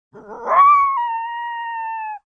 Coyote Howl, Single. Single Howl Followed By One Low Bark. Medium Perspective.